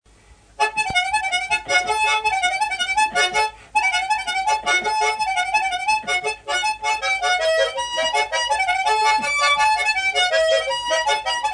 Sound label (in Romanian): Cretisorul la muzicuta; jocuri si cantece batranesti; Vaslui